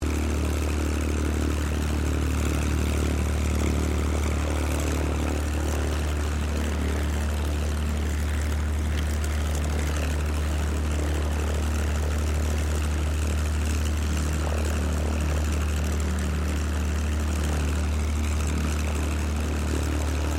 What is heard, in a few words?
AIRCRAFT P-40 KITTYHAWK SHUTDOWN; P-40 Kittyhawk / Warhawk / Tomahawk; Airplane Sound Effects; Airplanes (Sound Effects:Vehicles)